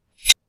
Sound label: Horror Transition Sound; Vtkproductions